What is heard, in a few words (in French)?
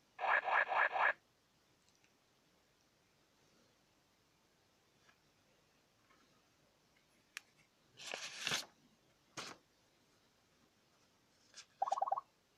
bruits